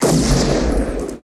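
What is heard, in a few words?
laser gun; Total Control - Action Cutz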